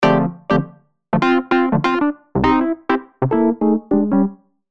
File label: 100 D Whirly